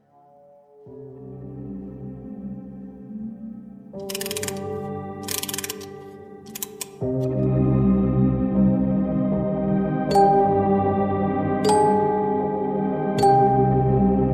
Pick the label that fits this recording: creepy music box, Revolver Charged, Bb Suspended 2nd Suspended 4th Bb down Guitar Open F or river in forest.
creepy music box